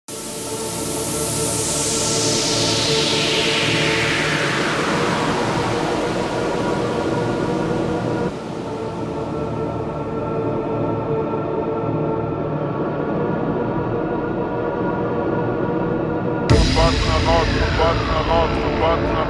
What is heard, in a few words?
brazilian phonk